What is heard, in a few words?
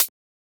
Closed Hat